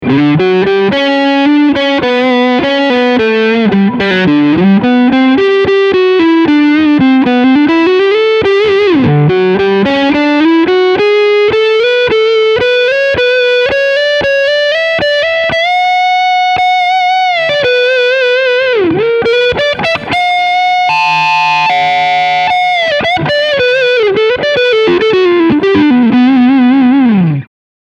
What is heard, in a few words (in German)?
Crunchsound beide PUs